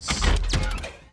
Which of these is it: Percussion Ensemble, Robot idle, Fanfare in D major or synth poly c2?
Robot idle